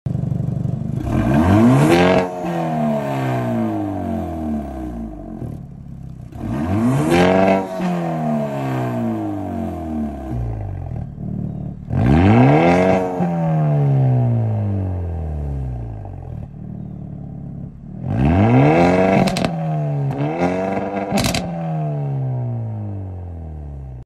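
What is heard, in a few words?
Hyundai Elantra, exhaust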